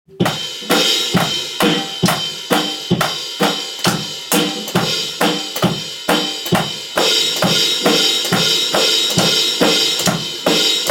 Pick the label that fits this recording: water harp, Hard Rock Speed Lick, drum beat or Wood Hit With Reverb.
drum beat